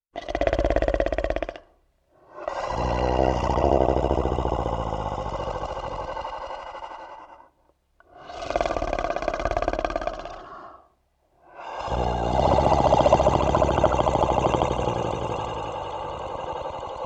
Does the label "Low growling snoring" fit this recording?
Yes